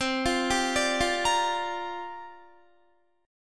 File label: C 7th Suspended 2nd Suspended 4th, Guitar; C7sus2sus4 arpeggiated